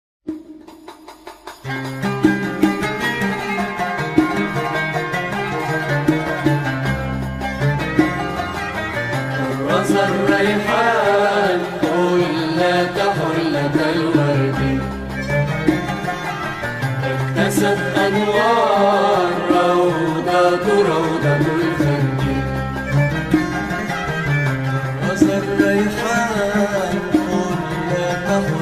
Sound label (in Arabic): موشح اندلسي Andalusian Arabic